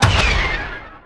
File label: Menu Scifi Enter; Menu FX